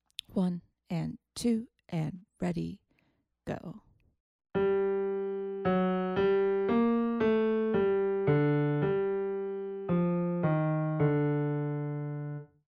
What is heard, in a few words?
melodic dictation